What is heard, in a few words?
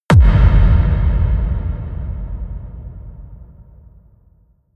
kick impact; hits explosionen; impacts